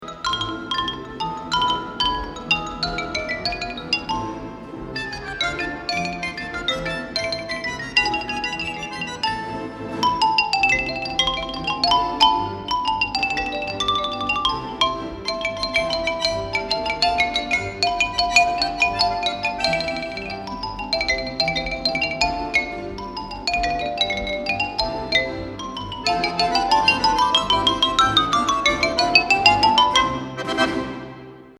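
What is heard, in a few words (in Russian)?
Ksilofon, xylophone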